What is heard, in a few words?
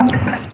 raindrop; cityfly